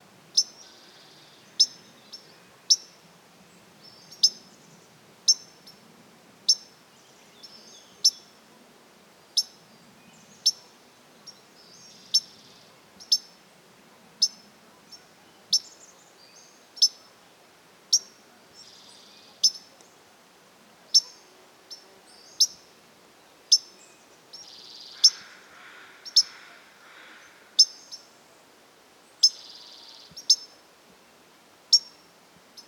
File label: White-crowned Sparrow Zonotrichia leucophrys; sparrows